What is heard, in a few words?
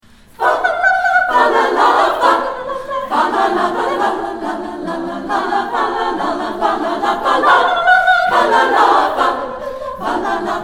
Russian Dance